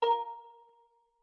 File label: Prompt Cursor; Move the cursor in a prompt; Skyward Sword; zelda